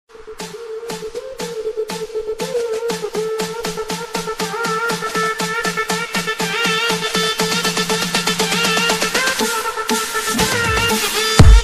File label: Whistle psy trance